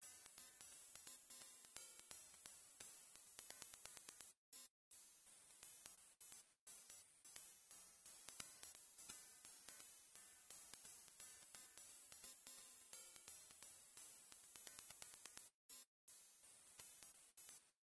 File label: Story and Dialogue; Quack Shot; Genesis